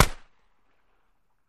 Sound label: Gun Shot Dry Sngl Sho, shots